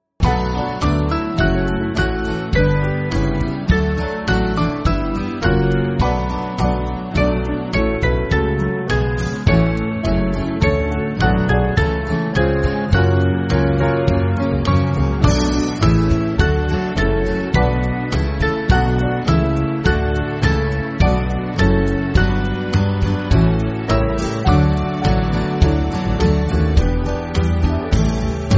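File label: Band, Music Backing; Hills Of The North; Little Cornard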